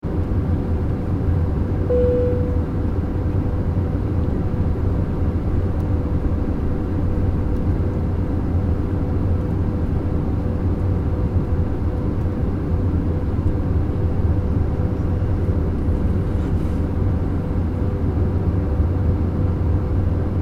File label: Room Tone Aircraft Interior Page Beep Very Little Walla Or Movement; Urban Spaces; Room Tone Sound Effects